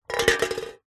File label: foley cans soda 200ml 2x empty knock over on concrete; zapsplat